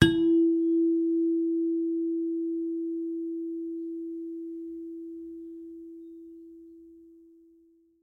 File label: chimes E4